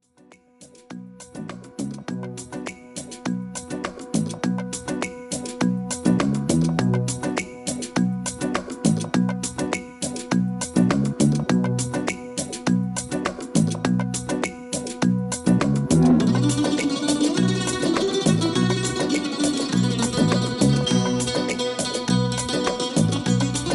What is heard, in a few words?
Bouzouki Song